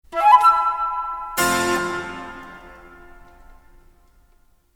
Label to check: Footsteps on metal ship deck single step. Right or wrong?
Wrong